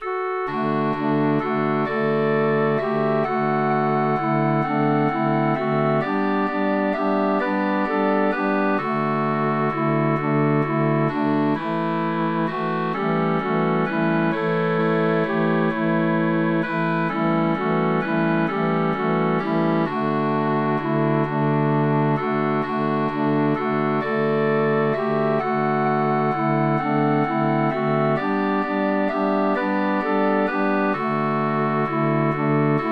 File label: Melody Harmony; I'll Be All Smiles Tonight